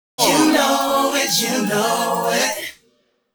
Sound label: Sweet Intro Example -10 Music; Radio Geretsried; Opener